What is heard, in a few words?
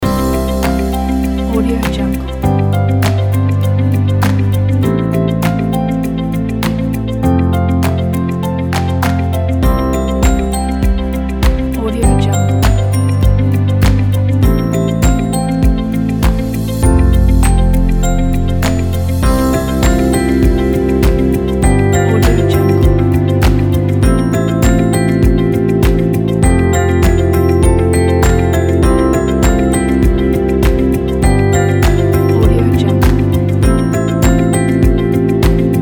soft corporate loop